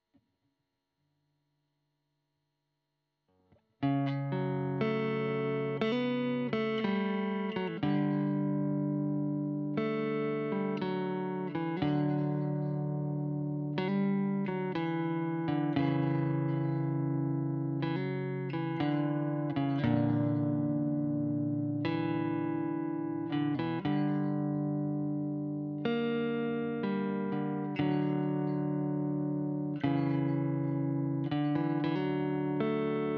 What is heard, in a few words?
GTR Royer